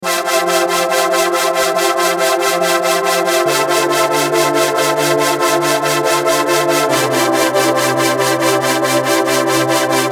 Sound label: Future Bass Melodic Trap